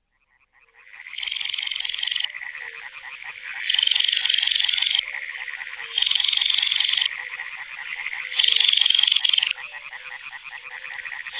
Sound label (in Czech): Chrastal nejmensi Porzana pusilla